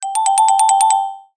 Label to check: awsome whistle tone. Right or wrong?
Wrong